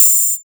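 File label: Tr909 hat open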